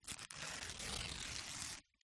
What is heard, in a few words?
glitched tones baking paper tear